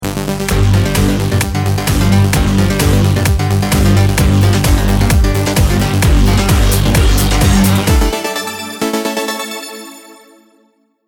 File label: round ten sec count, music